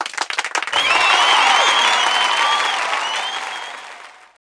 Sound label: Birdie; Ambient Sound; pangya fantasy golf